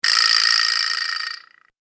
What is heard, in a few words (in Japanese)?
時代劇で有名なあの音